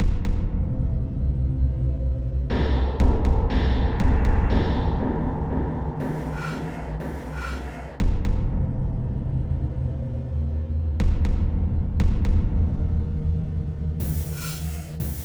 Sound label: Danger Tonal; Insanely Twisted Shadow Planet